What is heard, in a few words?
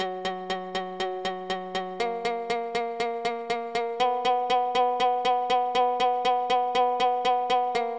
Japanese Instrument